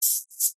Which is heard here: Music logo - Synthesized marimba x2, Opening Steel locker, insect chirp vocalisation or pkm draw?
insect chirp vocalisation